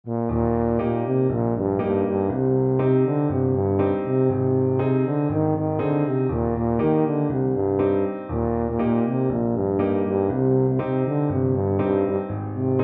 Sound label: whiskey in the jar, tuba